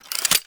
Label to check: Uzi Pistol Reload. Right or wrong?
Right